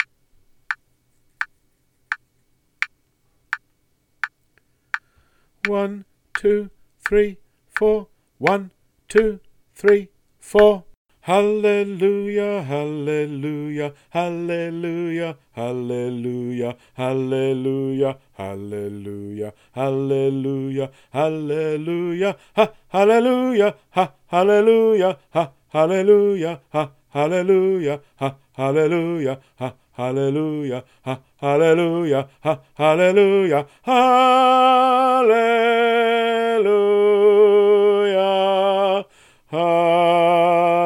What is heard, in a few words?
Round Canon in D in Ab; Paschelbel